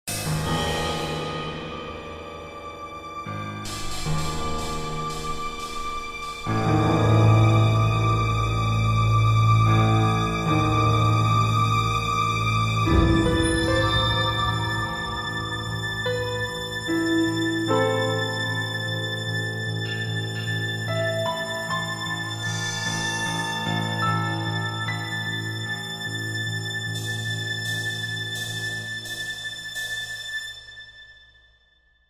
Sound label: Disturbing Night (Build Up)